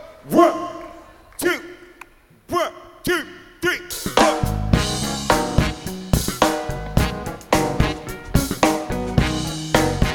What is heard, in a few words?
jazz blues